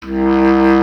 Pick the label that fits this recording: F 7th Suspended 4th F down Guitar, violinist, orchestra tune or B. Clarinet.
B. Clarinet